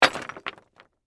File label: Crash, Rock, Debris, Small, Dump; Rocks; Materials; BlastWave